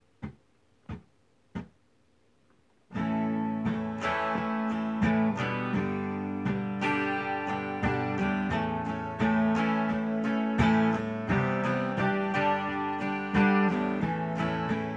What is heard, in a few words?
music acoustic; sol de luis